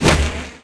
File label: projectile, damage; player; physics